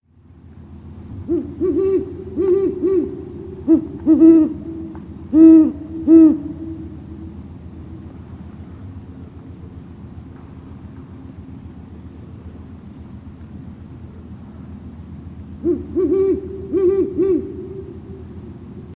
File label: Great Horned Owl